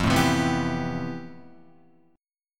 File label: F Major Sharp 11th, Guitar; strummed; JGuitar